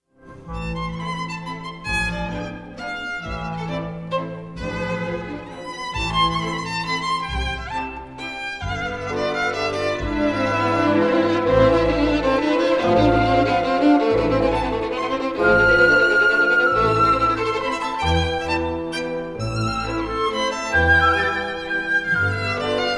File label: symphony orchestra, mazurka in g major vivo; bbc scottish symphony orchestra aleksander zarzycki; op 26